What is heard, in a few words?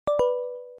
scan sound